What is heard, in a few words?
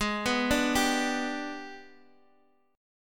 Ab Minor Major 7th Flat 5th, down, Guitar, Half Step Down